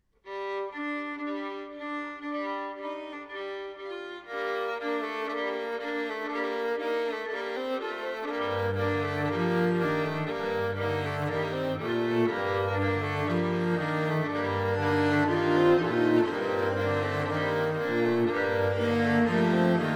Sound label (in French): classique; reich tours